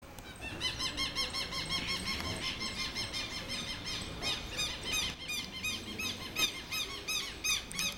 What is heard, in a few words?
Vanellus chilensis, water birds; Chordata